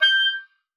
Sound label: OBOE2, G6; Xsample VOL-6